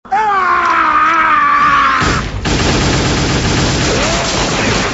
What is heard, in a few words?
shoot; hl2dm